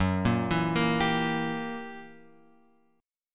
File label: F sharp 7th Flat 9th F sharp; F#7b9 Chord {2 1 2 3 X 3} arpeggiated